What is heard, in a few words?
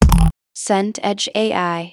kick drum